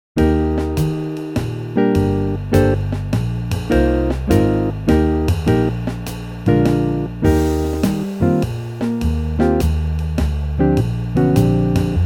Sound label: Eb Three Beats Per Bar, brass